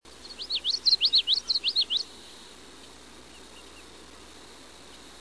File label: Common Yellowthroat